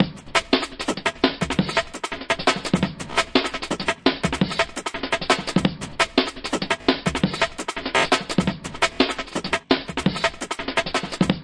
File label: tweaky stutter roller, drum loops and breaks, 170 bpm; 161 to 180 bpm